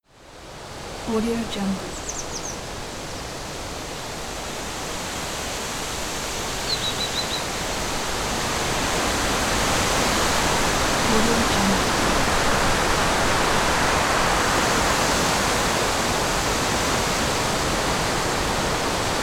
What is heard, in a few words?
windy forest ambience